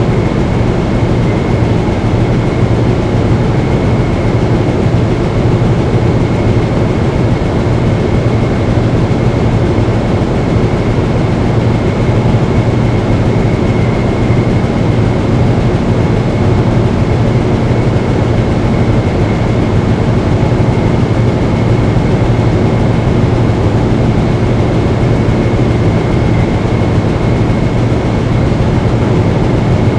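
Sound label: ambience industrial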